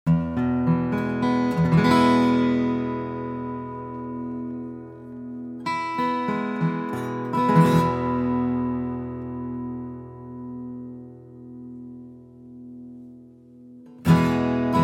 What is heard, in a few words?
Froggy SJ Deluxe; froggy bottom sj deluxe sunburst 2012